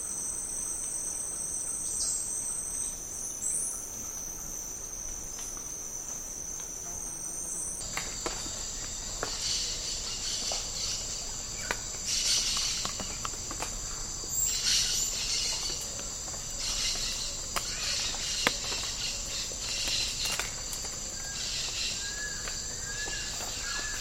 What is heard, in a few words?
tropical rainforest habitat insects